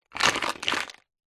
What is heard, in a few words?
gems jewels stones in small container short movement roll around inside